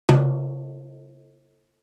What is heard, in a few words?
tom brushes